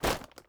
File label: ui drop tarp; Rust